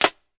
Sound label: CL, Dirty Hip Hop Kit; drums full kits; style kits